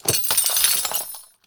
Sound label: Kabal Crystal Runner Death